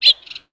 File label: Amb Animals; Red Orchestra Ostfront 41-45